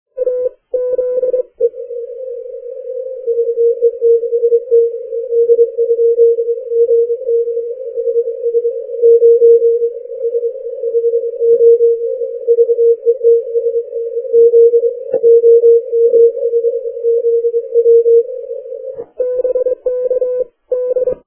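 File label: 80m long path; OM5ZW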